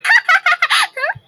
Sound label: cute giggle